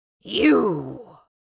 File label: Witch fantasy vocalization short shout